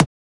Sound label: Transient; FLPRO